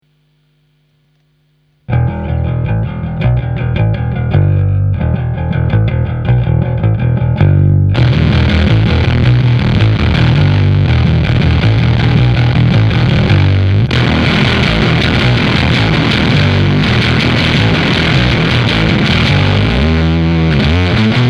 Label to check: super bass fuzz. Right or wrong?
Right